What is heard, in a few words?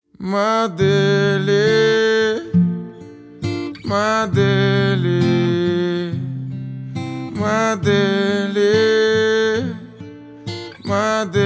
Acoustic Version